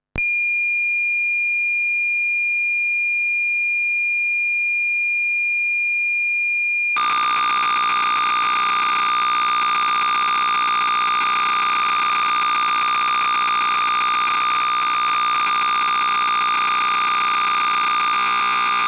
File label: Roland TR505